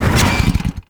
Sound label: Stop, Motorcycle; Warframe; Vehicles; Motorcycle Ninety Nine